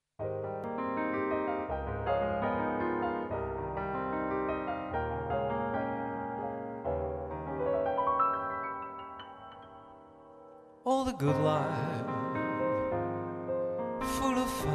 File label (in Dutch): ZANG + PIANO